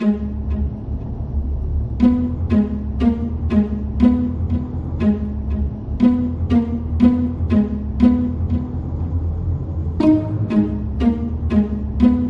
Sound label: slow rise